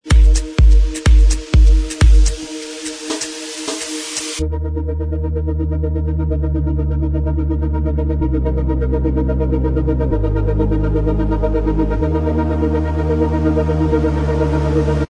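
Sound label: instrumental, backing track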